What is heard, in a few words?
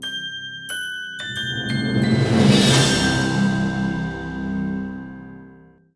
Success Stinger; Epic Mickey; Wii